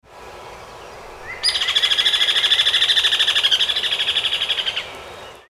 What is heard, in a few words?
HAWO